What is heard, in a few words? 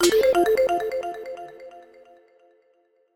Hit (Correct); Google Be Internet Awesome World